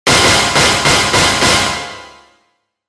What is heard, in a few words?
pinch